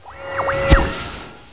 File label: Dispel Magic Target; warcraft3